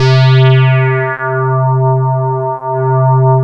PULS LEAD; MORPHEUS

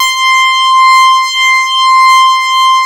leads; Masterbits; Vintage Voltage

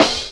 Snare, Percussion Hits, Snares

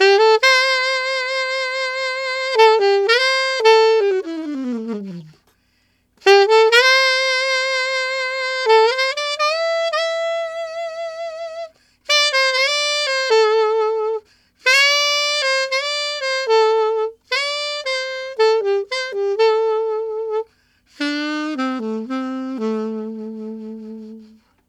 Alto Ballad in A min, Sax; Sonic Foundry (Sony Creative Software)

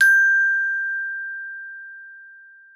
G4, GLOCKENSP S; Xsample VOL-4

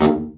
BRA Bari, SAX; Best Service Gigapack 1; BR SAX 2

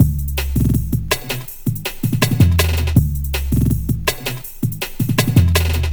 Straight Loops, Jungle; Zero-G Jungle Warfare 1, 2 & 3

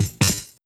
BUGS SD2; 66 BUGS

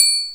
Triangle, Alesis Hr16, Drums

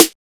TINY SNARE; Drums In Your FACE!

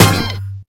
Horn Pile-up; Mo' Phatt; Digital Sound Factory Mo' Phatt

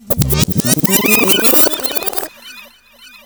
REVERS TAP; USB Soundscan vol.27

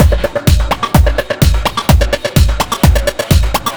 Full, Drum Loops 127bpm; Planet Emergency; Nu Dance Electro

